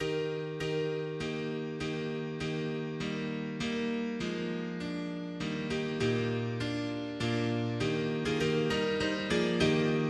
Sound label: mono piano; hymns; Himnario grande